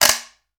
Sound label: guiro med hi, percussion; ILIO; Synclavier Percussion 2; World & Orchestral